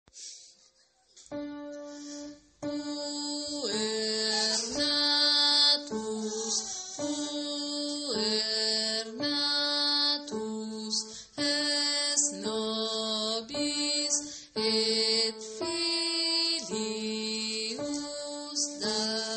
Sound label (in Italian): bassi; puer natus